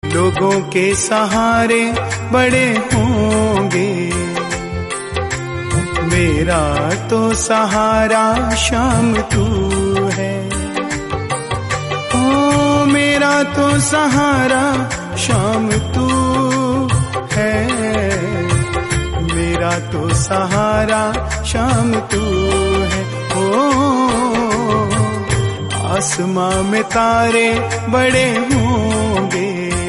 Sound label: remix romantic hindi